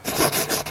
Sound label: wipe